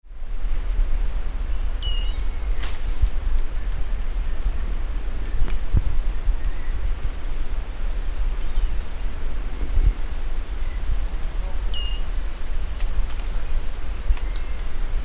ariane de sophie, chant

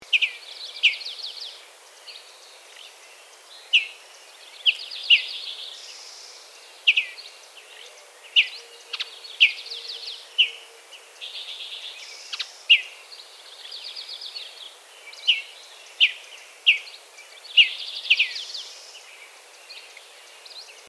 merlebleu de l ouest, chant

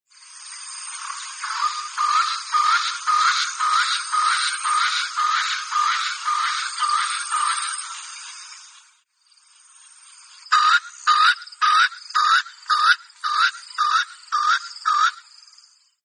francolin de swainson, chant

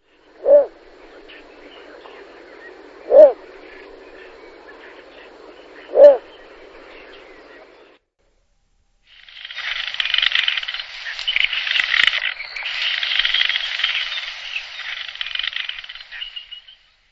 blongios nain, chant

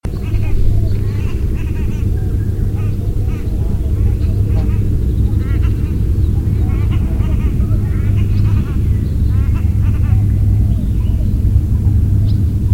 anserelle de coromandel

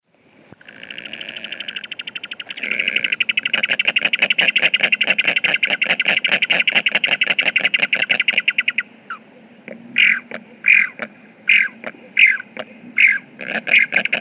brassemer de patagonie

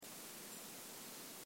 grive musicienne

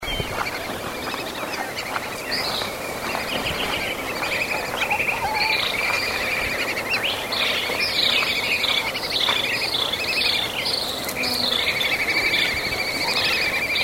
dendrocygne des antilles

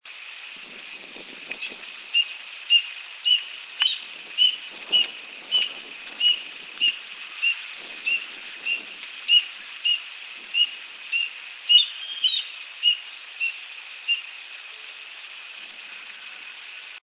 buse a queue barree

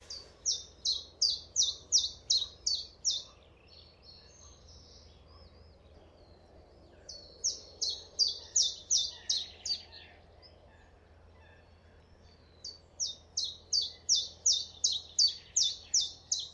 pouillot veloce; chant

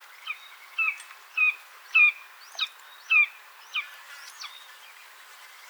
buse variable, chant

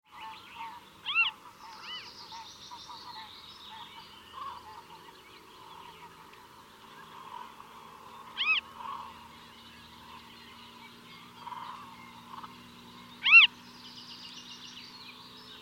busard des roseaux, chant

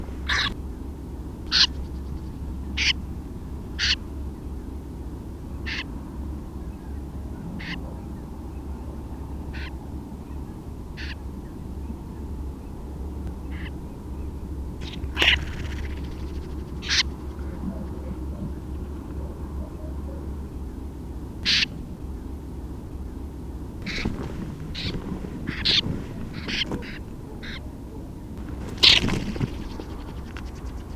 becassine des marais, chant

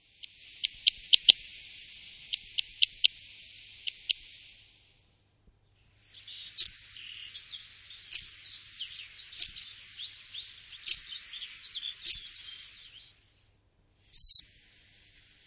bruant proyer, oiseaux chant; Les oiseaux

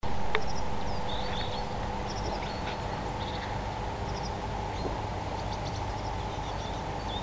accenteur mouchet, chant

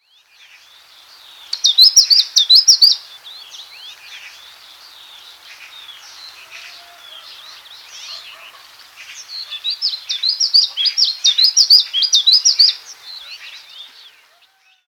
pouillot veloce

